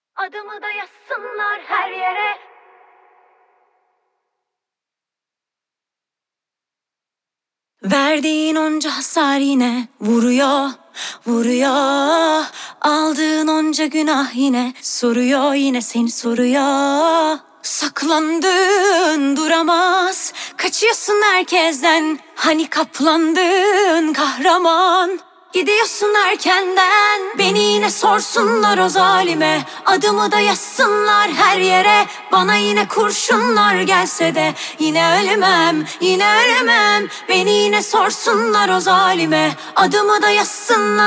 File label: Acapella